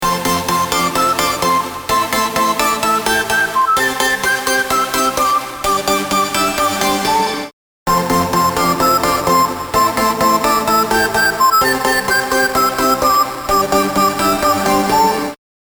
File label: bitcrusher